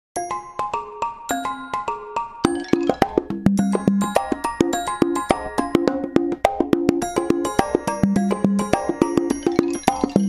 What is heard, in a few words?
african tribes; itel